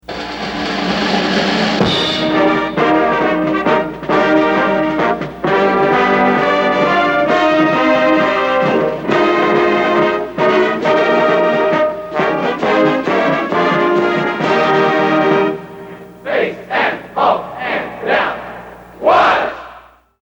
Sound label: Marching Band